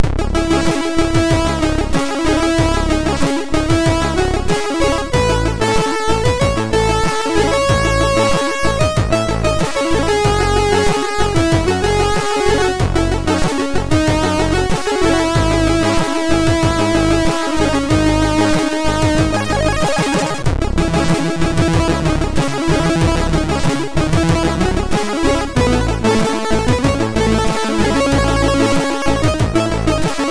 sid MOS6581R2

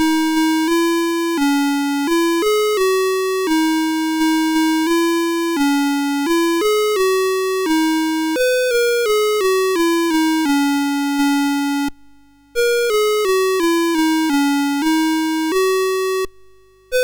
sid MOS6581R2

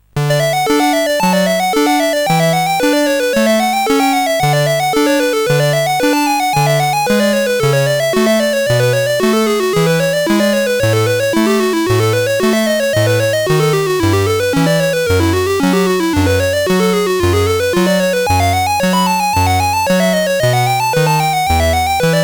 CSG8580; CSG8580R5; Prelude No5

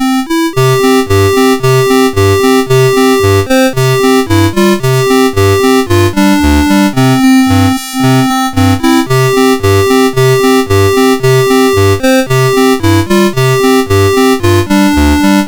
Hymn, MOS6581R2; Marines Hymn

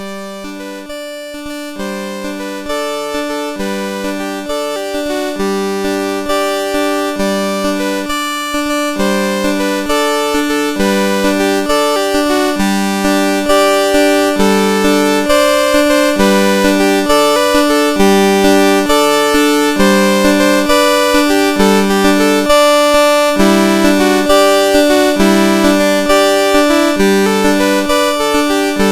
Angelus ad Virginem, MOS6581R4

